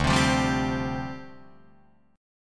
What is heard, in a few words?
D Minor 11th D down Guitar Drop D